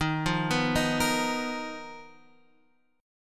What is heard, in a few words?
B Major 7th Flat 5th D sharp, chord shape